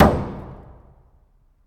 Bonk

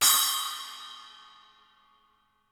splash

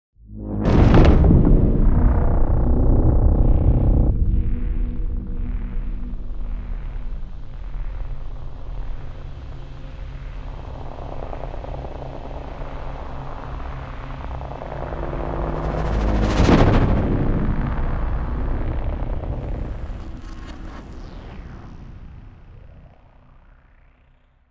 Cinematic Atmosphere

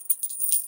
Coins In Pocket

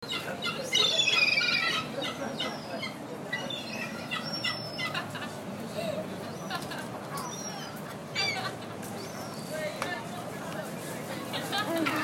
Seagulls And Chat Brighton

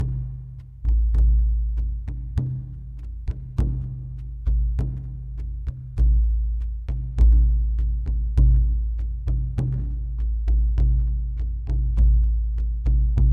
Native Drum Loop 100bpm

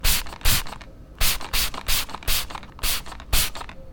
Spray

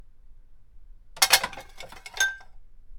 Dishes Clanging

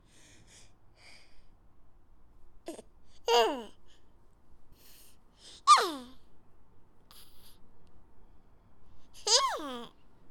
Happy One Year Old Baby Noises

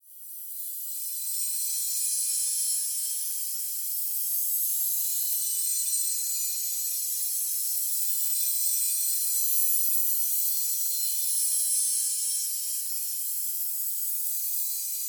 Fairy Ambiance Binaural Chimes

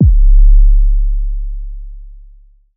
Hard Kick

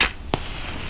Light match

